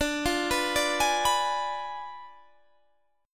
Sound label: D Diminished 7th D down Guitar Standard; Ddim7 arpeggiated; JGuitar